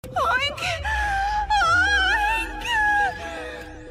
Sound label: oink